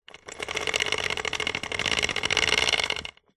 industrial chain small pull over thin wood hoist medieval medium slow